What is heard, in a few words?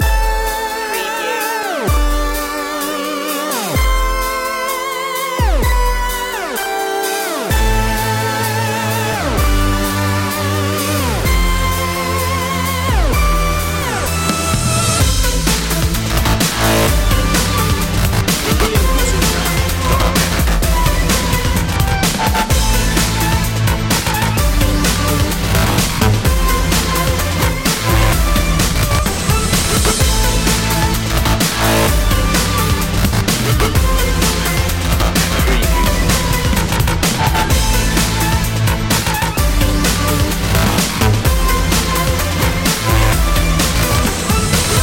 destroy dubstep